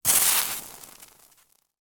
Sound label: sizzle